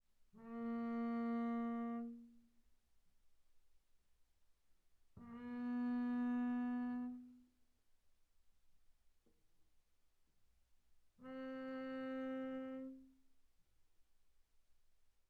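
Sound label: Bass arco sul D pianissimo Bb3 C4, double bass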